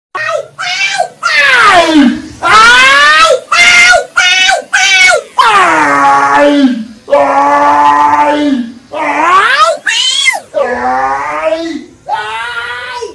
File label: aaaaaaaaaaaiiiiiaiiiiiiiiaiiiiiiiiiiii